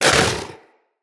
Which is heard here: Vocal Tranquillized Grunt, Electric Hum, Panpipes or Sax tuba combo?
Vocal Tranquillized Grunt